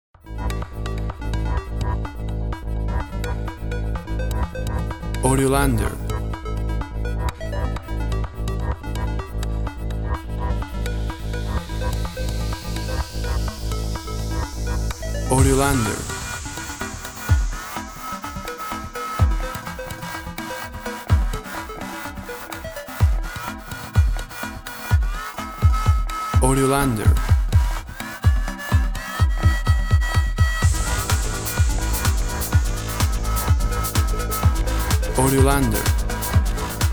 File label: Top 40 EDM